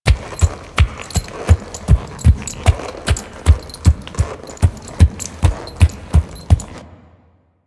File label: Horse Steps Walk Loop; Warframe; Vehicles; Duviri